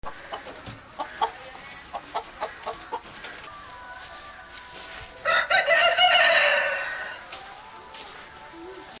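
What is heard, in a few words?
chicken; soundscape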